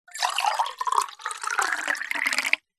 food drink wine or water pour into wine glass flute